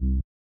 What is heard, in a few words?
Sub Bass C1; 140bpm; Grime; Zero-G Sound Sense Grime Scene; Construction Kits; Astoria